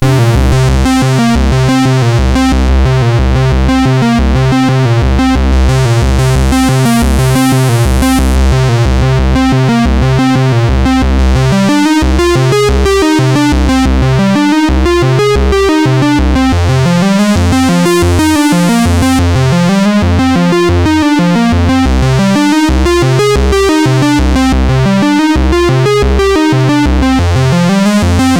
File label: Block Tune sid CSG8580R5